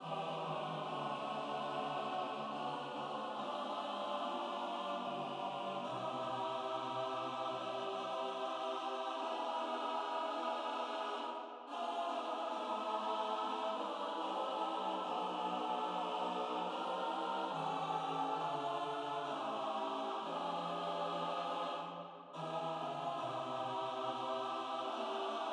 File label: SATB piano